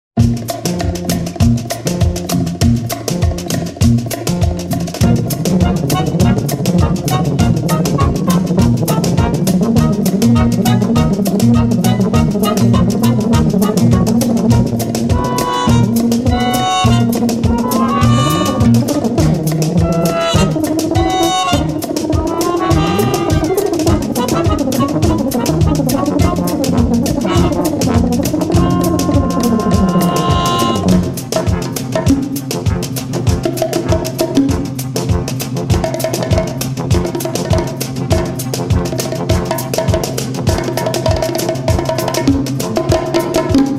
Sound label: tuba